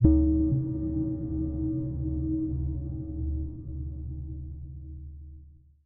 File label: bloop; hl2dm; oppressive territory